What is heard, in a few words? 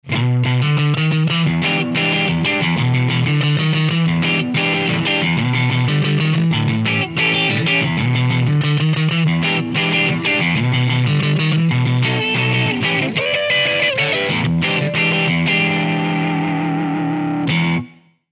GT-10 Crunch Strat